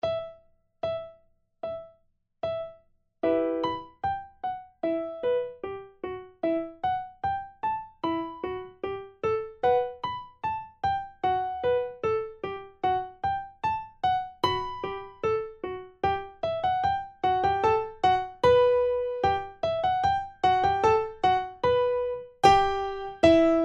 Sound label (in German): Primo, Vierhaendige Uebungsstuecke; Diabelli